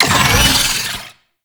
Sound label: Boss Vocal Pain; Warframe; Oraxia